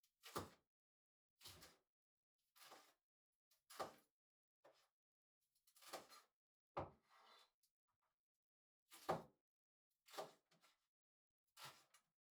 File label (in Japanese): 切る ピーマン, 食事に関する音; KU100